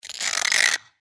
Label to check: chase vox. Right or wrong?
Right